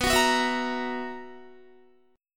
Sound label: B Major 7th Suspended 4th, Guitar; BM7sus4; strummed; 18,21,18,21,18,18; 4F,4A,5C,5G,5C,6E